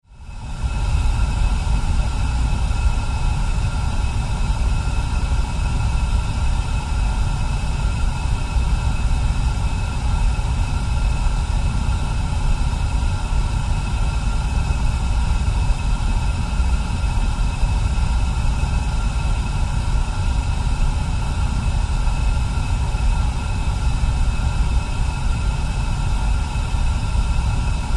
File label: Engine Room Ambiance, spaceship fx